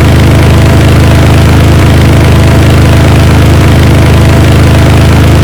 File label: r7 petrol medium, engines; acf engines